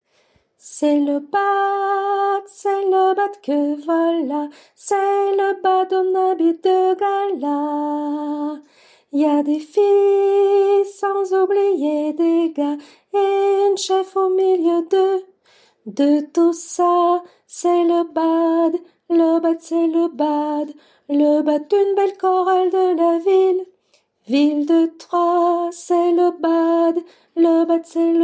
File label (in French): alto; C'est L'Aubade que voila